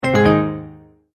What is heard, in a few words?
multimedia tone end